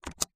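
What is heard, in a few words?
computer keystroke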